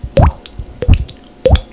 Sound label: DRIPS